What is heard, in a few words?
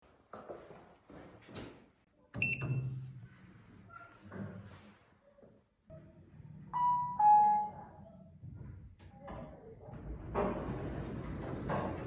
lift sound